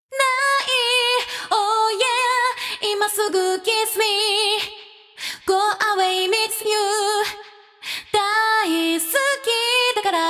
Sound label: space On